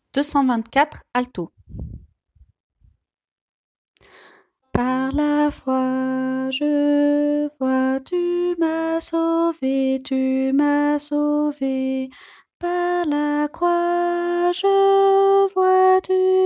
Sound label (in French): alto